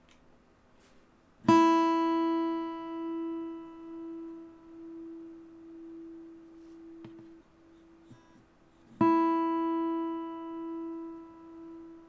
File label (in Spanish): Sonido de guitarra acústica cuerda 1 Mi E; afinar una guitarra